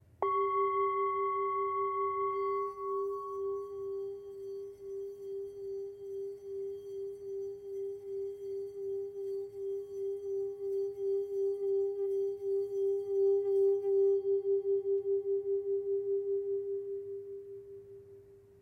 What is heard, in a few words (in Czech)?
tibetska misa; Tepaná tibetská mísa Gyal o hmotnosti 460 g, včetně paličky; Tibetská mísa Gyal malá